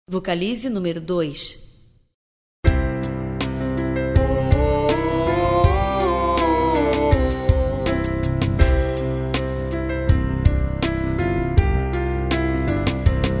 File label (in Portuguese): sem voz, vocalizes canto; exercicios canto